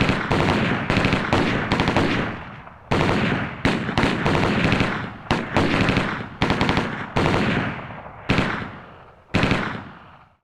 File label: gunfire, ambient